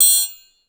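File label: TRI STC HI, triangle staccato high; Best Service; Peter Siedlaczek's Advanced Orchestra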